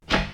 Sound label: Trap Switch